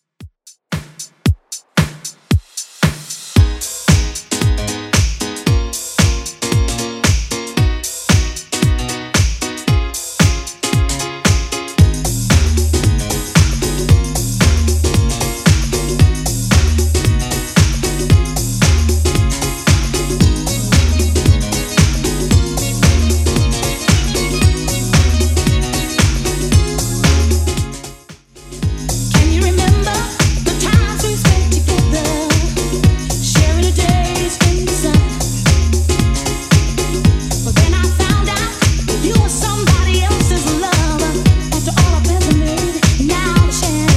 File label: 80s Redrum 114bpm